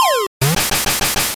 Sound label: Smoke Screen; Pokemon; Gen 2; attack moves; GSC